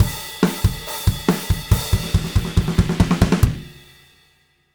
Drums Rock Ending, loops; Alex Sanfilippo

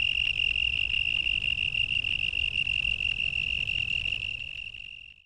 crickets Loud; animals

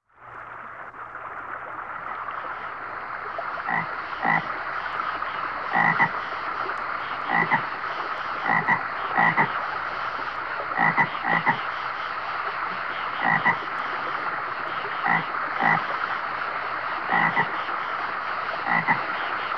frog bullfrog insects stream; animals

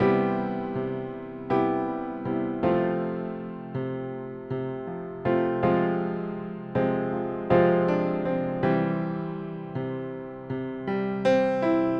70s Ballad Piano

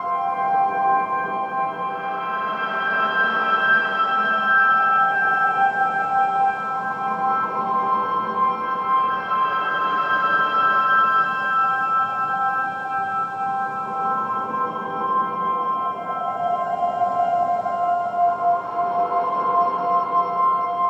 C Piano Drone Move; Ambient Textures; Instrument Drones

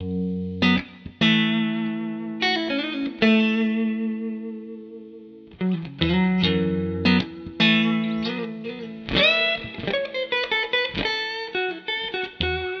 Blues Electric Guitar Clean Bright F# 75

